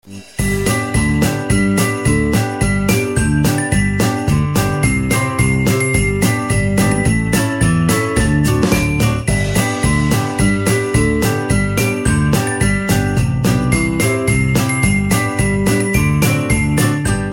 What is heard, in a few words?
jingle bells